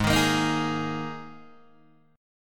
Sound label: G sharp Suspended 2nd, G sharp, down, Guitar, DADGAD